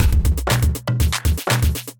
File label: Kit, Drums; Kindified Kits